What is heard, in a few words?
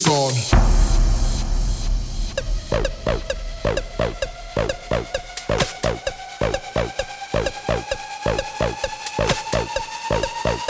130 BPM